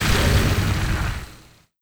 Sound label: Laser Hit Craft